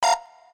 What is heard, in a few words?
button